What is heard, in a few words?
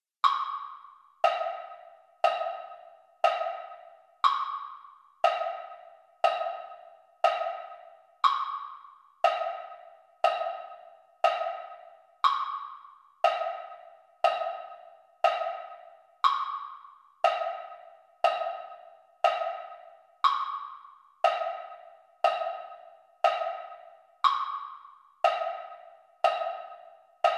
mellophone; concert corps